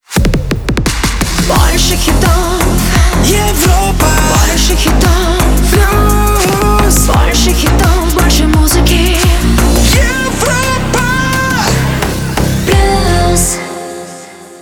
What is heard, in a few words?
Jingle; Europa Plus 2022